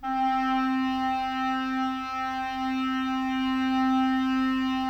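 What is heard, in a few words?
clarinet; Miroslav Vitous; Woodwind Brass Ensemble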